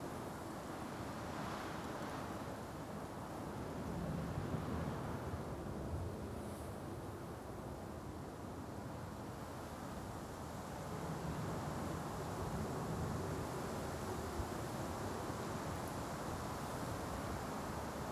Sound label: ambience wind distant surf; Rust